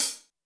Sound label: closed hihat, Zoom MRT-3B